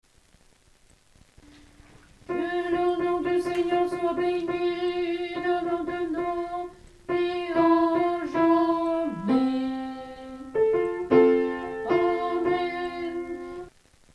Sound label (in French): Que le Nom du Seigneur alto